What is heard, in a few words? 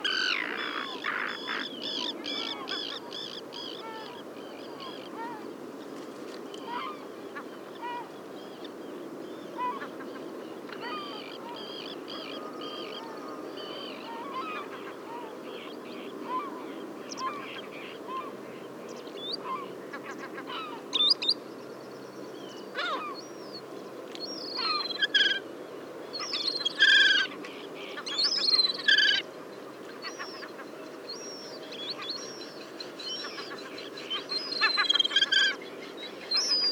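Caspian gull juvenile; seelhaus see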